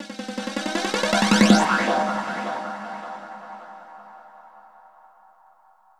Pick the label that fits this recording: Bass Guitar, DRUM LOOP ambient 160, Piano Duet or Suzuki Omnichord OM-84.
DRUM LOOP ambient 160